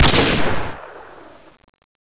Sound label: scout fire